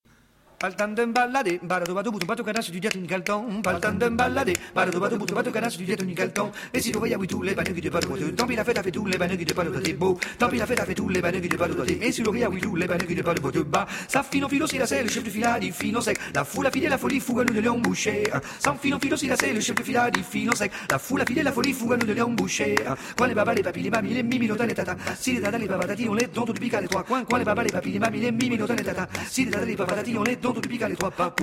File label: canto